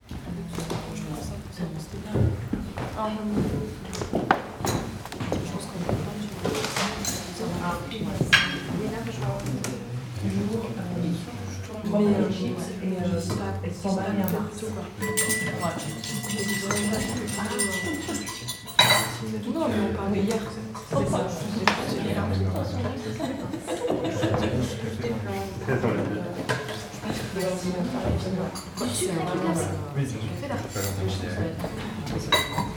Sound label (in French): tournage cafe